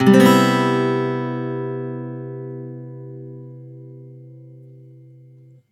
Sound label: C maj7 downstroke, Acoustic Guitar; Sonic Foundry (Sony Creative Software); Songwriter Acoustic Guitar Companion; One Shot Chords